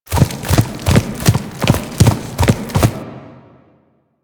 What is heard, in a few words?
Ram Run Loop; Animals; DE; Warframe; Quests; Duviri